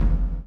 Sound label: CHAIN; Spectrasonics - Backbeat